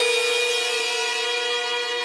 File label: synth main